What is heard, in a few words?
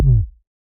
Ball Collision Forcefield Light